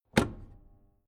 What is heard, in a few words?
Industrial Large Electrical Mains Power Lever Switch On Metallic Impact; Switch Sound Effects